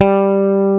BASS G-3; ROCK

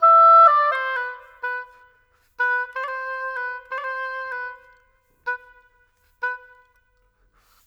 Oboe, Orchestral; Sonic Foundry (Sony Creative Software); Orchestral VOL-2

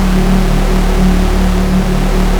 Prophet 5 D2; Hollywood Edge - Giorgio Moroder Rare Synthesizer Collection

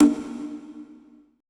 CONGA L; BPC DRUMS

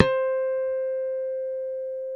BASS C4 HARM; D-STIK BASS1

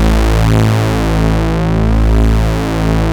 OB A2 -R, Rare Synthesizer; Hollywood Edge - Giorgio Moroder Rare Synthesizer Collection; OB 4 VOX.

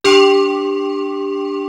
BELLS; East West - Global Sounds

